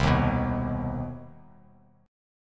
C Minor Major 7th C; strummed; JGuitar

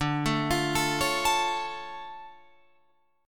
D Minor Major 7th, D, Guitar, Open F; DmM7; arpeggiated

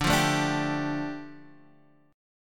G 7th Suspended 2nd

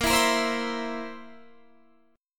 Bb Suspended 2nd Flat 5th Bb down Guitar Standard; strummed

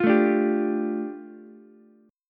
A 7th Suspended 4th Sharp 5th, A, down, Ukulele; A7sus4#5 strummed

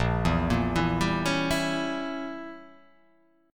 A sharp Diminished 7th, chord

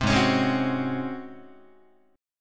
Ab 11th Ab Guitar; Ab11 strummed

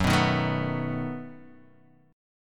B 7th Gb

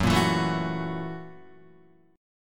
Gb 7th Sharp 9th Gb Guitar; strummed; Gb7#9; JGuitar

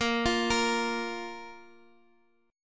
A sharp 5th A sharp

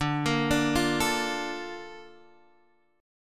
A sharp Major 7th

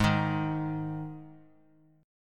G sharp 5th G sharp; strummed; JGuitar

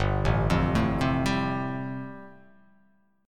G 7th Suspended 2nd Suspended 4th G

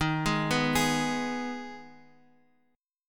D sharp Suspended 4th Sharp 5th, Guitar, Open F; arpeggiated; JGuitar

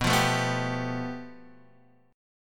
A 7th Suspended 2nd Sharp 5th B, chord

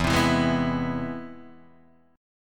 C Augmented Major 7th E

